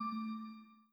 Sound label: Windows User Account Control; GMM Windows 10